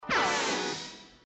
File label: beep